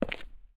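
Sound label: gritty cement run, footsteps; chief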